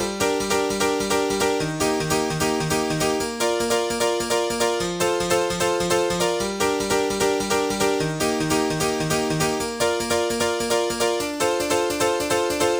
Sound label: Tune Up G; Vengeance Samples